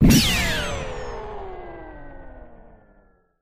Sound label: beamgun